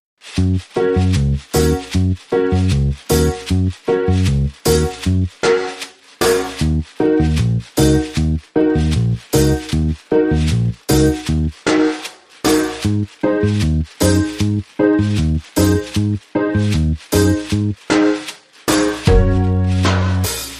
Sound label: Reggae Reggae Riddim Full